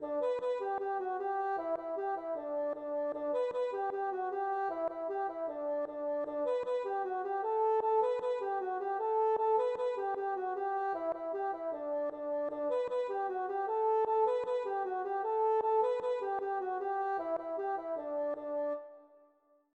gavotte; Kimiad Ar Soudard Yaouank

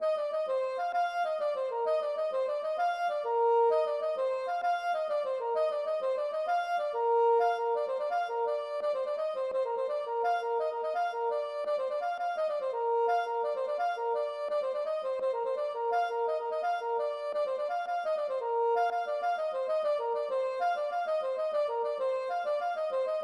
gavotte; Aven Bals

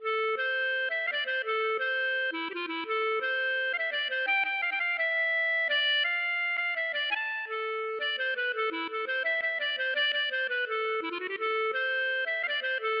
klezmer

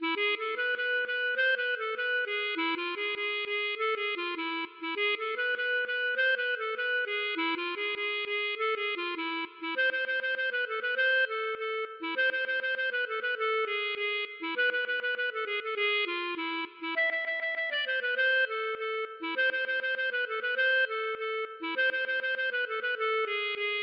klezmer Khosidl

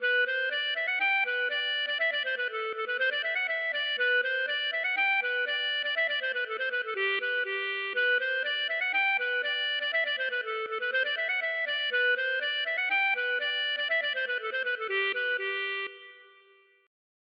polka

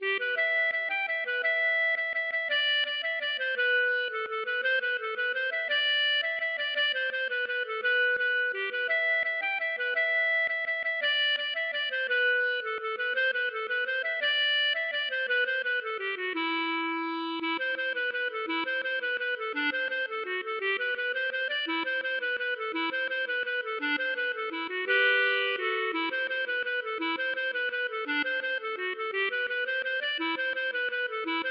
mazurka

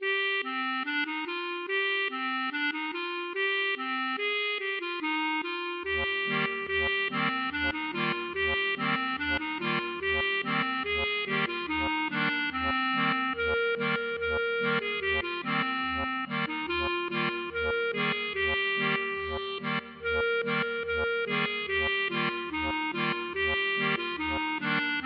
klezmer

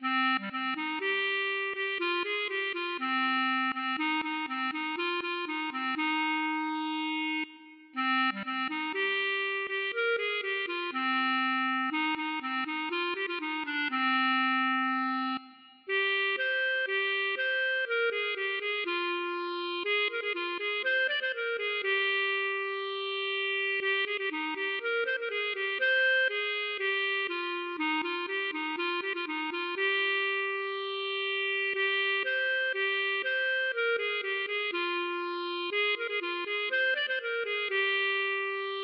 klezmer

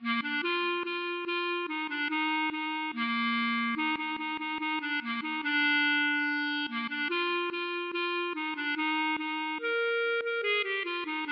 klezmer

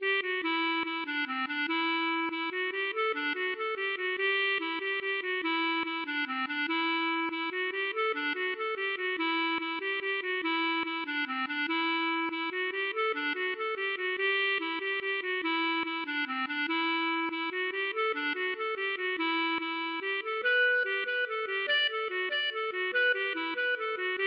mazurka

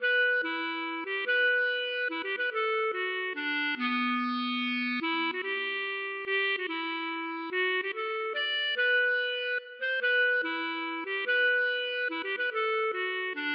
mazurka

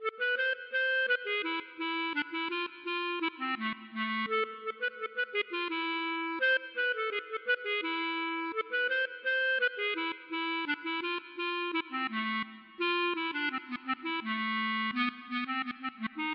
mazurka

